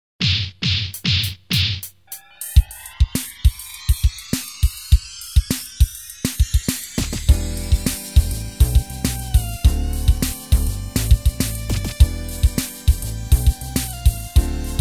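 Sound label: R&B; Soundzabound Volume 1 Disc 2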